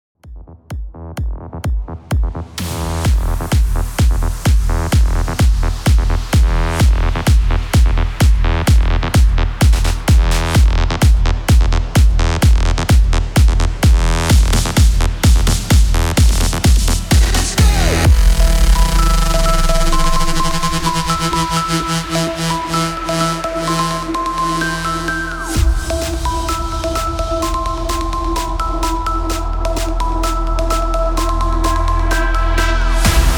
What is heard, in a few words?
128 BPM